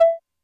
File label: Hi Pipe, Drum Machines Alesis D4